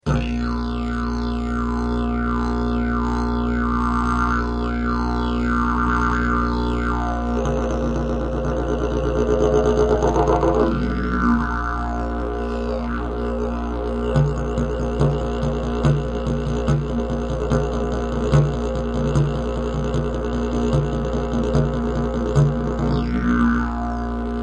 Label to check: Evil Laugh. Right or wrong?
Wrong